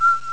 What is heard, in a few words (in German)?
floete; ST-XX Amiga Soundtracker Sample Packs